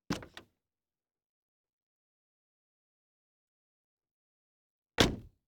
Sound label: Vehicles Door Saturn Aura Car Door